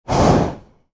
short blast of hot air balloon propane burner; sound-ideas

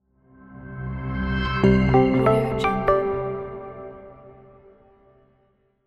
small logo

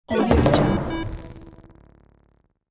long arcade synth fart

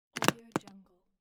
suitcase slam; littlesmith

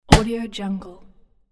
catch baseball

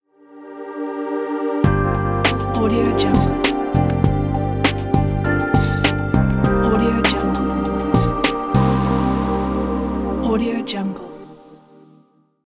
chillout logo

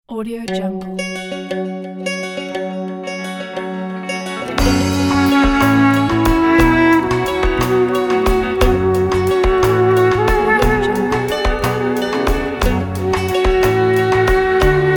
middle east song

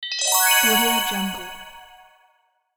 magic dust

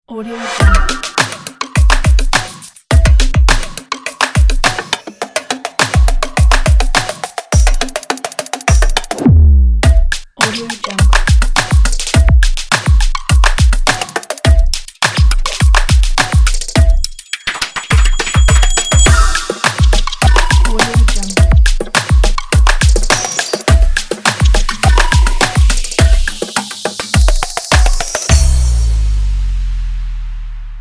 future technology drum news intro

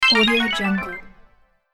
lose your health

